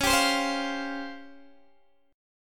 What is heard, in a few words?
Db Major 7th Flat 5th, Guitar DADGAD